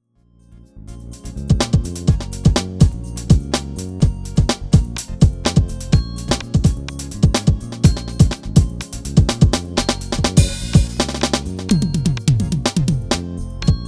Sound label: Playalongs